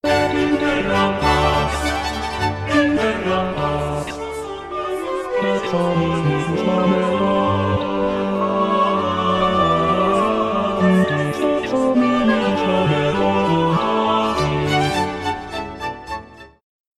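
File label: Gloria (basse), VirtualVoice; Stage Zelenka 2023; zelenka zwv21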